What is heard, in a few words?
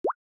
Water Drop Notification Night